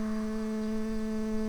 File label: bumblebees, Hypnorum workers